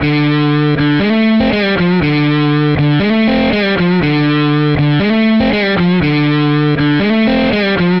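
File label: Guitar Retro D major 120 bpm; Guitar and Bass; Ableton; Factory Packs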